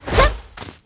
dash; Archemist F

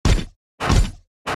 Walk; starbites

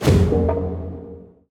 Achievement unlock

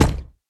Shield steps

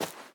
Footstep Sand

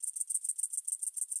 Grasshopper-Chirp; viewfinder

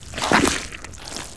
Bigworm Walk; Knight Online

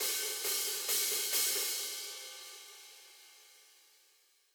Loop Break

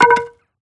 Baseball; Sfx Baseball

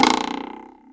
Cartoon, Warble, Cartoon Jump

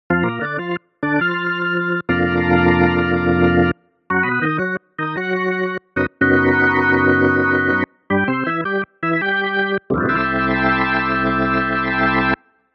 Music Baseball

